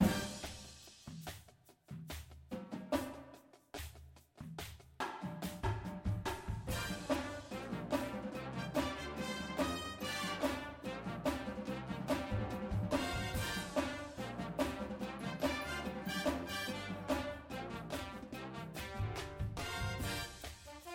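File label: tenors with music, drumline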